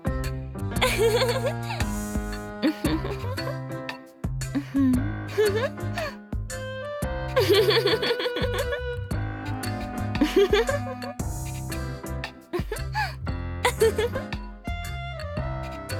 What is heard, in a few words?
giggles